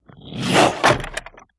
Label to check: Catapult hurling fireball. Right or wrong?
Right